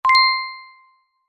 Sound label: multimedia notification bell chime ping tone